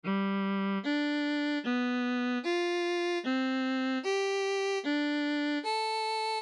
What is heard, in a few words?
fifths